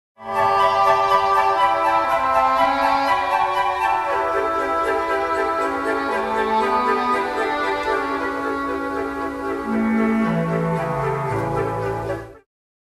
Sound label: Reed Flutes Cor Anglais